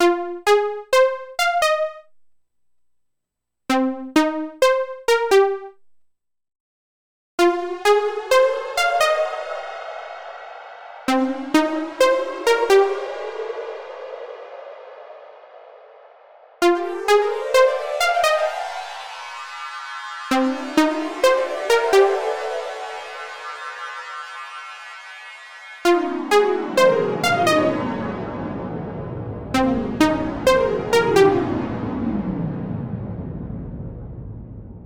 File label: Emission on Supersaw Synth